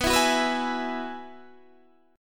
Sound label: G sharp Minor 7th Sharp 5th, chord